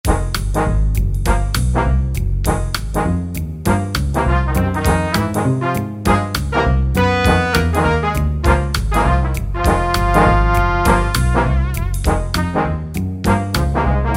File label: Brass, E-mu PK-6